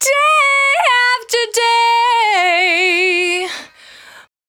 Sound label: Cry 68bpm B, Vocal; Zero-G